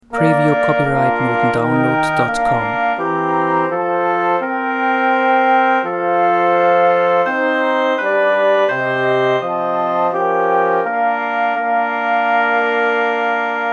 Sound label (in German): blaeserquintett